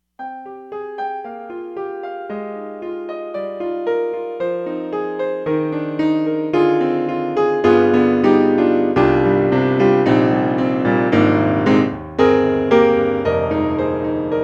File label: Yamaha TX1P Piano 1